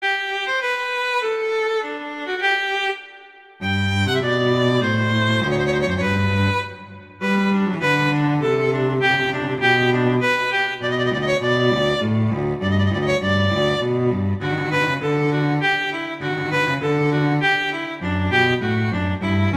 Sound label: Largo; Telemann; Canonic; Sonata 6